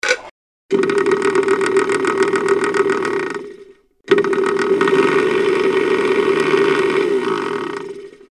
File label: funny machine fx